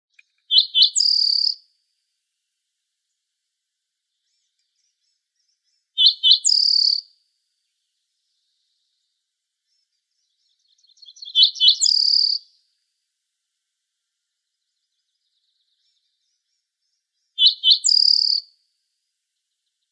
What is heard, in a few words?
Emberizids; AZFO; deviche